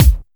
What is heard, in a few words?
Hard Kick; Vengeance Samples